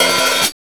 HAT LONG OPEN, VINTAGE FUNK; Zero-G; Funky Elements

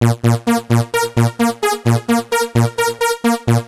Synth, 130bpm, Nu Dance Electro; Zero-G; Soundclash; Combi Loops

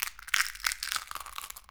EGG, household; AKAI Anime Sound FX

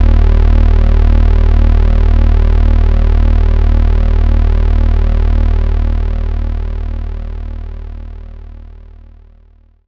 Long Stab Bass, Drum n Bass; KJ Sawka; Drum n Bass & Beyond

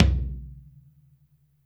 FLR TOM MOD; Q Up Arts; Denny Jaeger Private Collection

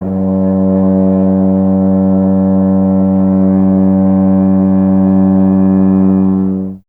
BRS TUBA, Solo Instruments

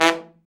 TROMB; Trombone

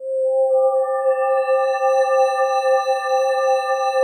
PAD, DIGI-PADS

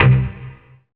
BASS, Synth Bass, Roland

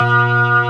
B3 C 2, ORGAN; VOCAL ORGAN; ORGAN #1

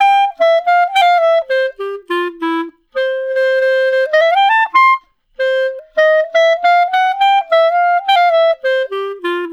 Clarinet Solo

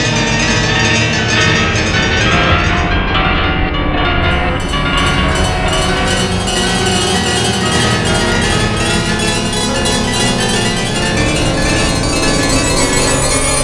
RADIATOR, Disjointed Textures; USB Soundscan vol.33; AKAI

